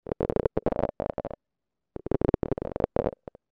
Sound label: crumpling; Continuous crumpling; The NIW Project; NIW: Natural interactive walking